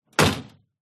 truck door old close

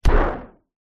medieval fire arrow fire

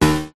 multimedia game negative buzz tone

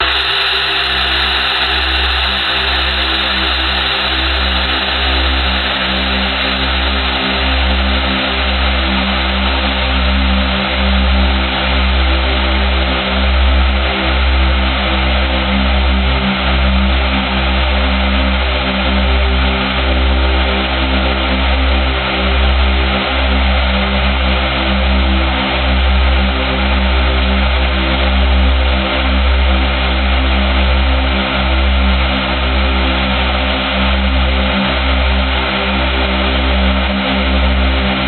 Atmosphere reactor leak; sumo blanco

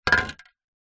industrial rust spray can knock over surface

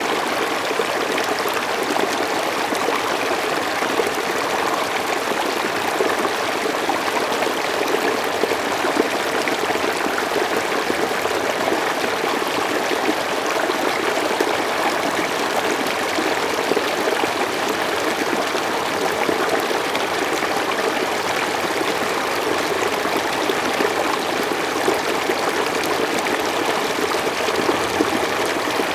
nature water brook close up; felix blume

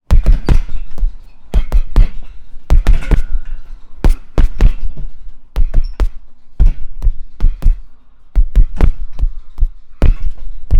sport gym punch bag on chains many hits; felix blume

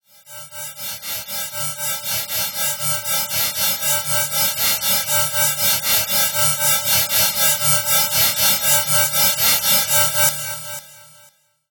sound design fm metal drone pulsate; zapsplat

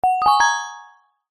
multimedia game sound gem treasure found

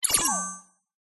science fiction control panel beep alert

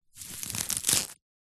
grass pick pull rip from ground; zapsplat; foley

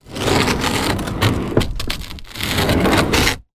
boat kayak pull movement on hard ground

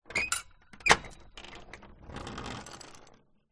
household gate wooden rolling unlock roll open

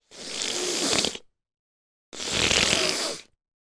human sniff snotty cold ill

